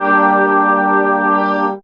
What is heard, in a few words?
synth g, Techno Chords